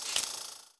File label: Jungle Menu Command; Win98sounds